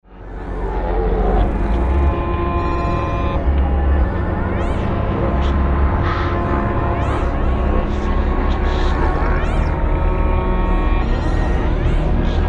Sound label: imitation